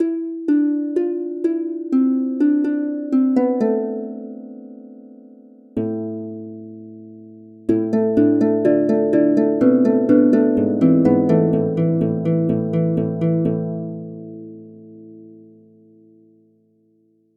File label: harp